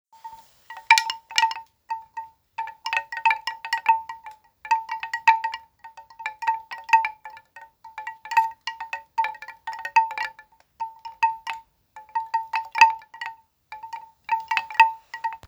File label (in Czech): medium, bambusova zvonkohra 4 tuby stredni